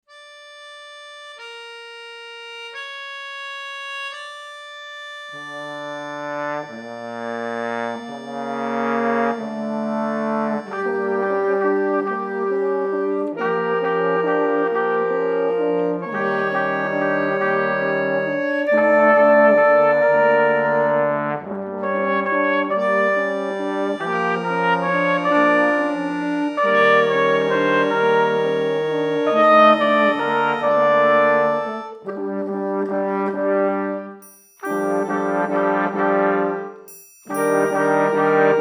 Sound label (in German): Umbaumusik Bahnhof